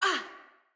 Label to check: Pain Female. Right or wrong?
Right